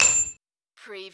Button Sound - Metal Light Ding

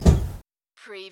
Servo Panel Sound - Release

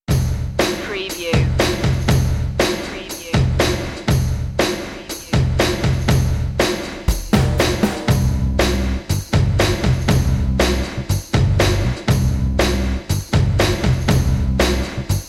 dnb

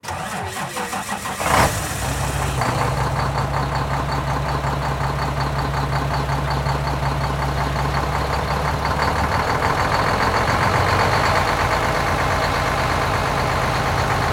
Semi Truck Start Idle; sound effects bible

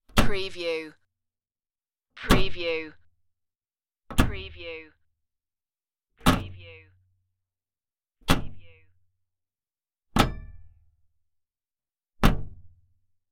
closing hatch

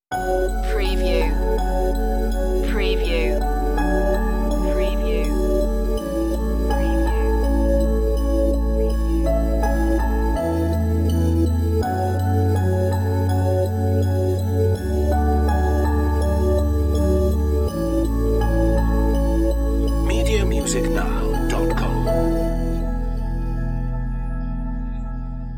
true reflections piano lead

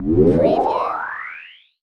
Retro Scifi Hit

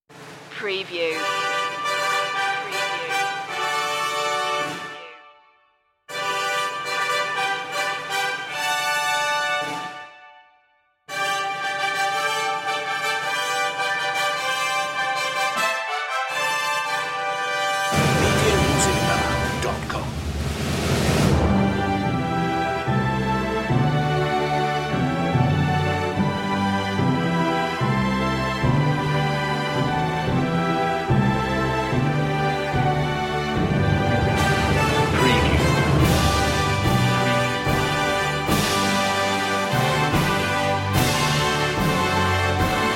god save the king no choir

